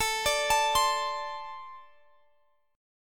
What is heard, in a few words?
A Minor Double Flat 5th